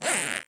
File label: item zipper open